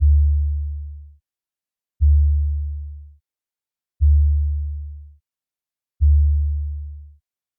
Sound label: sub kick vco 27ms attack; waves element